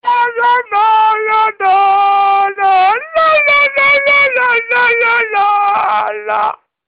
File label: lalala funny